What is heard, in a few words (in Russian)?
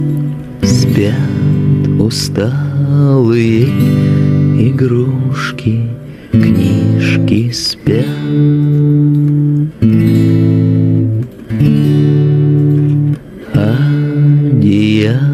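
гитара медленно моно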